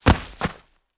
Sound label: Bodyfall Dirt Close Stereo Hard Impact